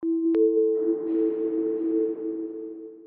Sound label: screen capture